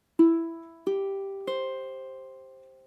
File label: chord egc, ukulele